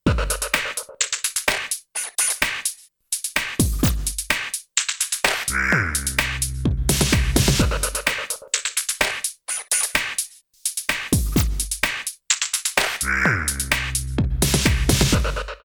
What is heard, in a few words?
tempest gated; DRP-20